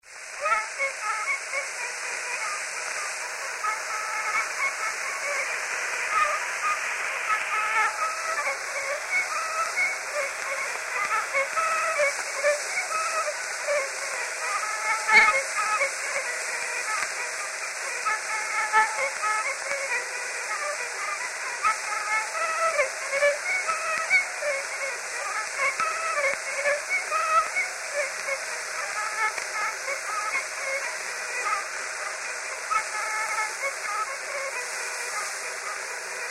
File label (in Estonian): Polka, viiul; Kuusalu